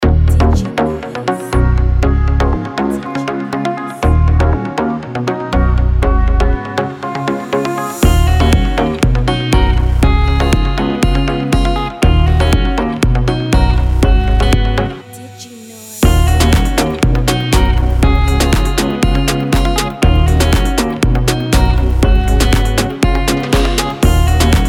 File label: Radio Chords & Melodies; Diginoiz